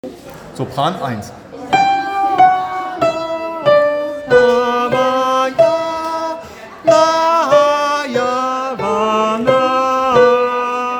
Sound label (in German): Sopran